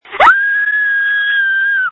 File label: screams